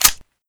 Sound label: bolt catch